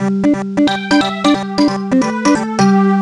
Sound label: Jingle Level Complete